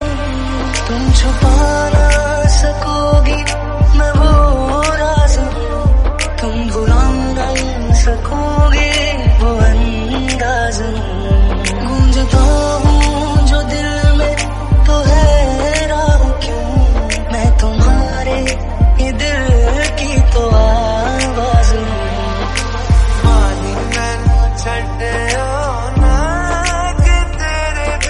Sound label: bollywood background music mashup ringtone